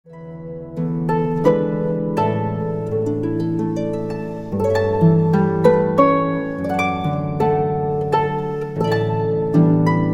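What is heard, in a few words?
We gather together, harp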